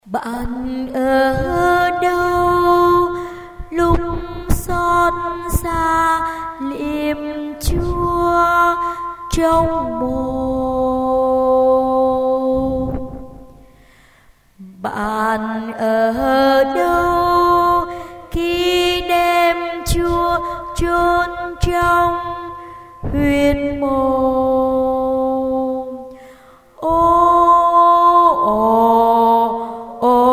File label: basso